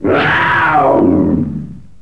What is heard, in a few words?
ANIML; Zero G; Man Machine